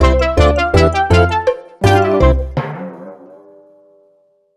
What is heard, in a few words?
gameover; 100% Pascal Sensei Kanpeki Paint Bombers; 3DS